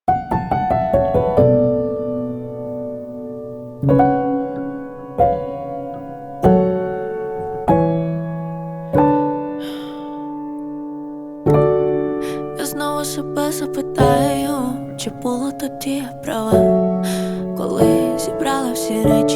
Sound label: acoustic version